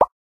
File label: Effect Tick, ui; ZTE Grand-S-2